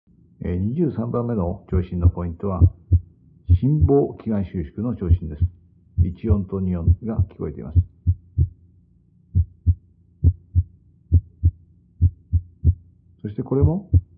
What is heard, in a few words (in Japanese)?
PAC心房期外収縮